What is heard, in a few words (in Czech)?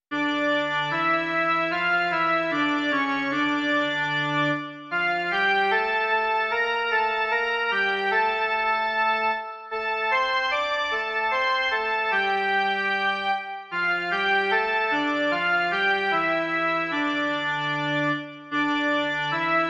jen melodie; Vládce světa, Bože náš